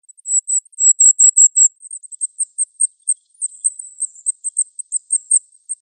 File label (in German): Stimme des Goldhähnchens